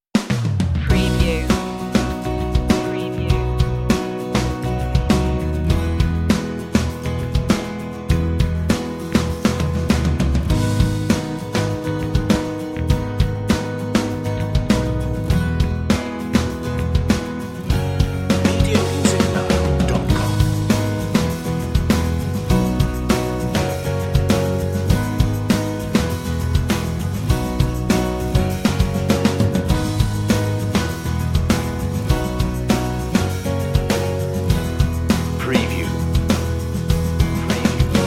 no melody

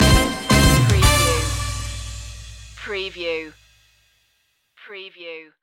the funky two stinger

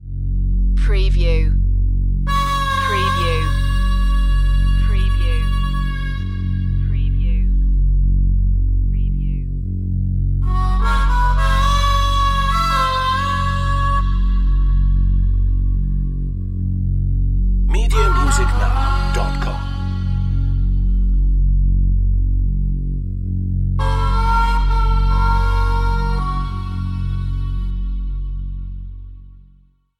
southern pain

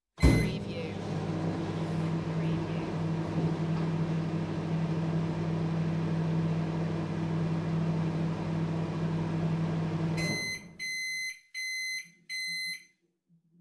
Microwave Operating for a Short Period; beanstalk audio

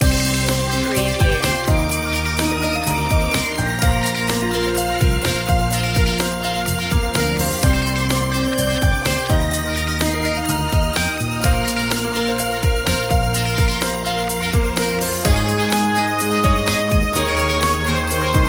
ambition score loop

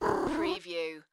Cat Meow

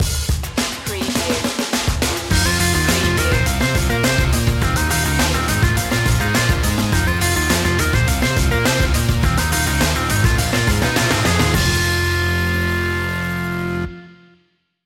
rock slam

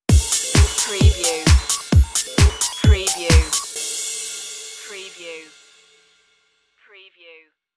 disco stinger

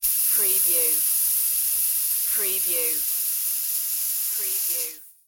Can Air Spray Long

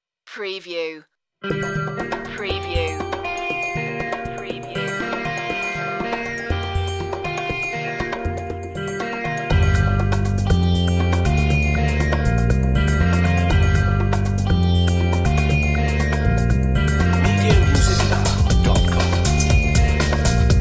ethno breaks

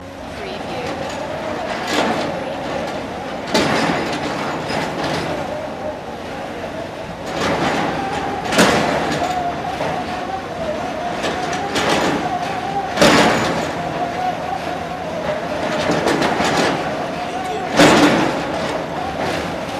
construction site drilling loop

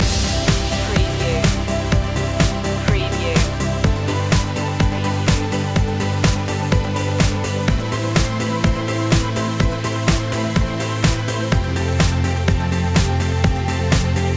liquid gold loop

